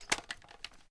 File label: reload empty rotate; gmod; viper